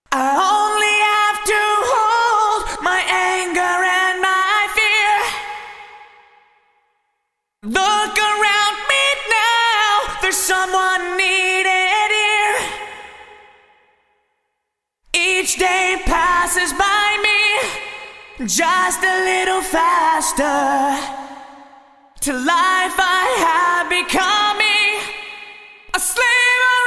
Vocals Sony HR MP5 Sparkle Plate Vintage Digital

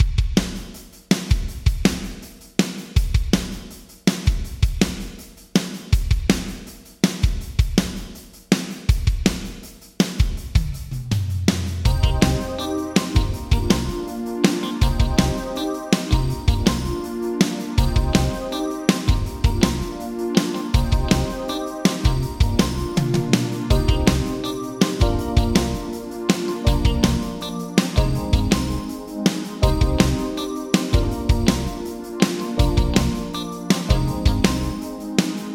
Drums Yamaha ProR3 Large Room 2 Vintage Digital

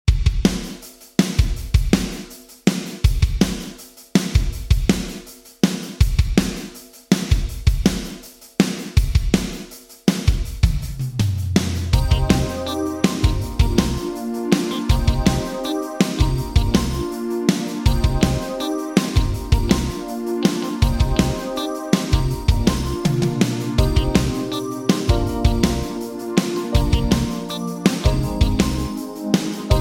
Drums Yamaha SPX900 Gated Reverb Vintage Digital

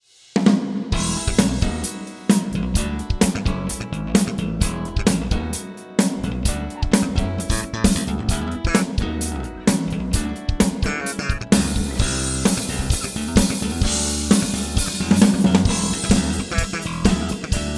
Korg A1 Drums Smooth Hall

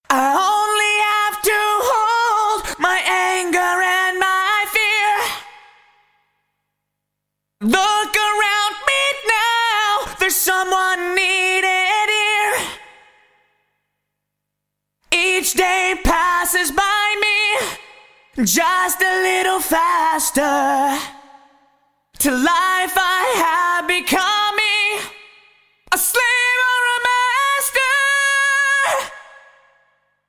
Vocal Roland SRV 330 140 Plate 1 Vintage Digital

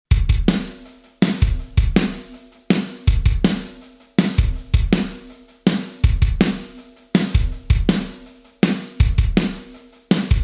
Drums Sony MU R201 Powerful Drums Vintage Digital

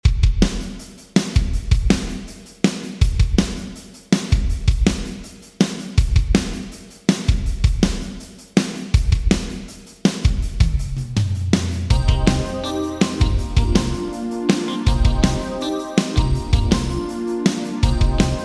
Drums Bricasti M7 Rooms Vintage Digital

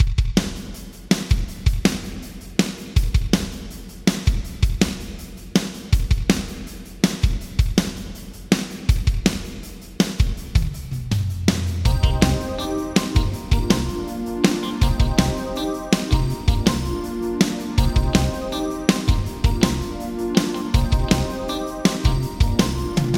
Drums Yamaha Rev7 Large Hall Vintage Digital

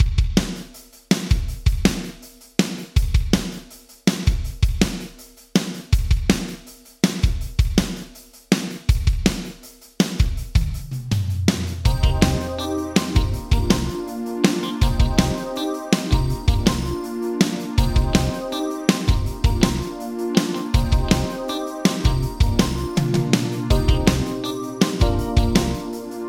Drums Yamaha Rev7 Gated Reverb Vintage Digital

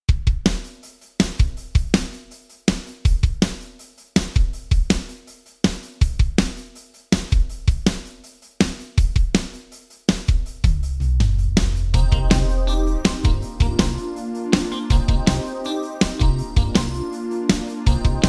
Drums Sony HR MP5 Drum Driver Vintage Digital

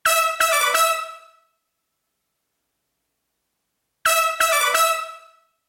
Brass Sony HR MP5 Wood Room Vintage Digital

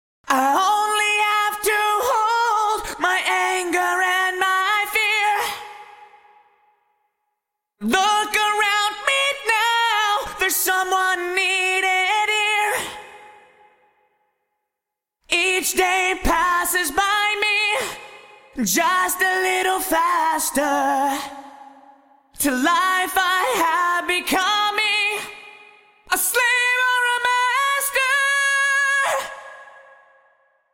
Vocals Klark Teknik DN 780 Plate Vintage Digital

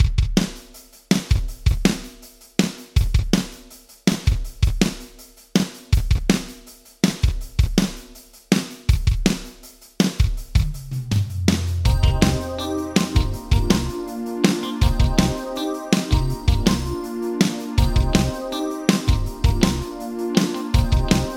Drums Yamaha D5000 Long Wow Vintage Digital

